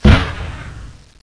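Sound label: Mortar Sound; Close Combat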